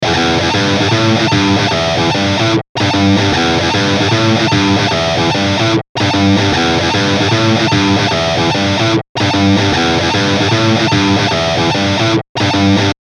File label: BRIDGE, eclipse active, 5150